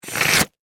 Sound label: Suction Mud, Texture, Suction, Mud; Wet; Water Sound Effects; Water (Sound Effects:Nature); BlastWave